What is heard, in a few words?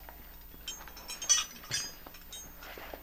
Metal Mvmt Light, chains